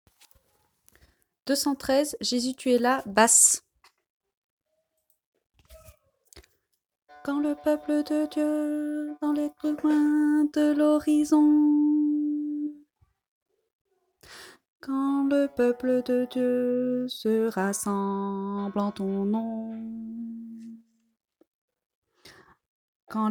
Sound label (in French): basse